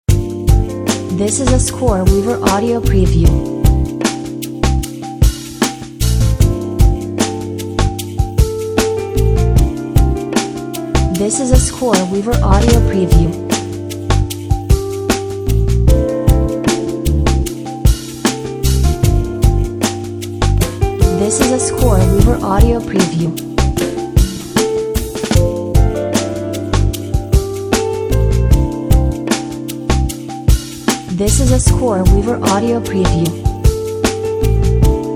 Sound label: coffee jazz lounge no vocals